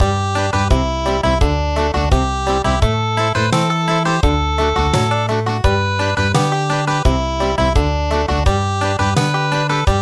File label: Country